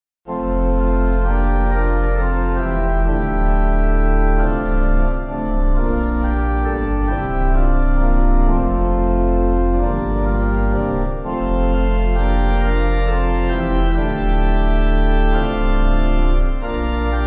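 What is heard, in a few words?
Pipe, Pipe Organ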